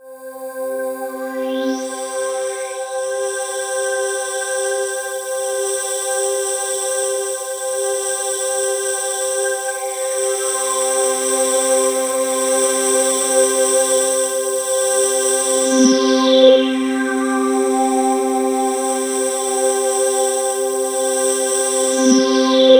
POLY SAW, Roland JV 2080, C4

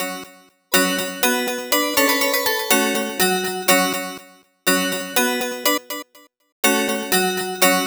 Harpsi; Sonic Foundry (Sony Creative Software); Club Joints and Street Anthems; Razor Edge

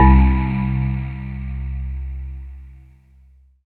SYN SY-77; Sound & Vision - Gigapack I CD 2

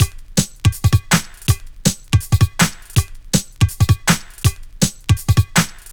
162, breaks; M8

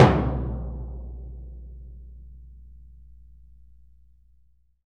TMP C#, Synclavier Percussion; ILIO; Synclavier Percussion 2; World & Orchestral

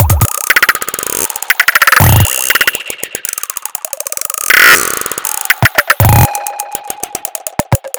Twirling Rolls SFX; Sonic Foundry; The Electronic Music Manuscript Collection; Drum Loop Structures

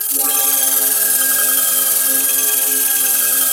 TELEPORT; Pro-Rec - Synthsonic

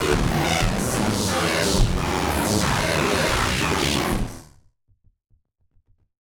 Malfunction Synth, malfunctioning synth samples

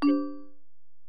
Bell, Alesis DM-PRO

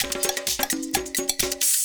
slurp; Zero-G Deep House; 130bpm

